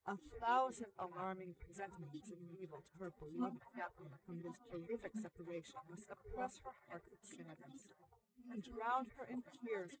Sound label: female inst src